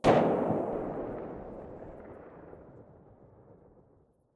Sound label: mdr silencer mono very far, weapons